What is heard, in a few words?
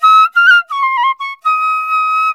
INDIA PICC